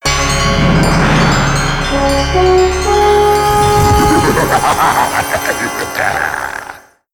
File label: Victory Music; Gauntlet Legends; PS1